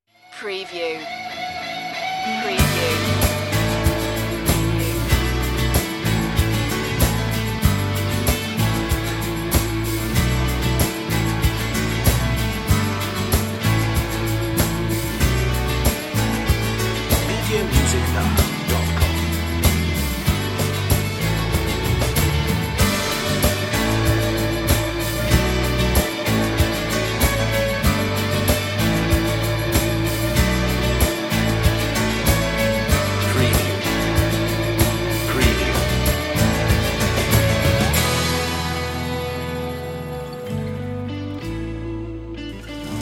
guitar version

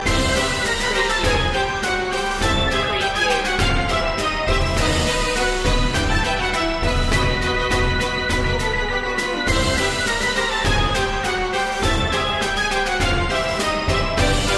big top loop

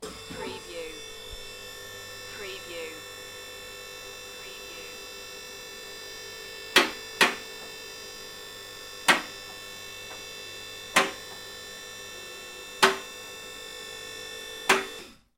Garage hydraulics car ramp; beanstalk audio

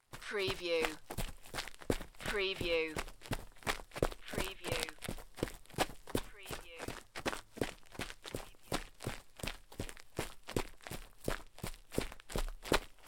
Footstep Dirt Jog Run

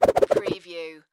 Whip Whoosh Multiple; Sound Effects Bible

